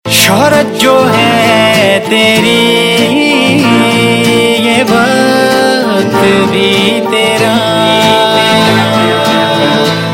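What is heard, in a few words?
Ringtone, Bollywood Punjabi Pop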